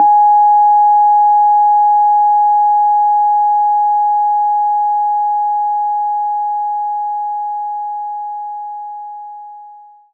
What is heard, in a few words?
Rhodes Ab4